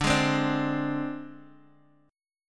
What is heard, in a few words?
Ab Major Flat 5th D